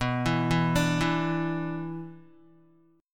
B-Minor Double Flat 5th, Guitar; Bmbb5 arpeggiated; JGuitar

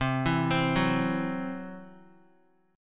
C-Major Flat 5th, Guitar; arpeggiated

